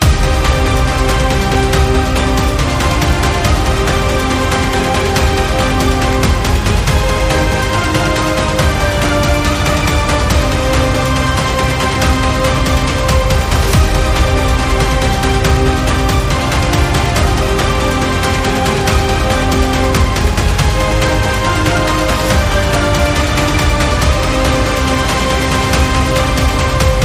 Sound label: No Choir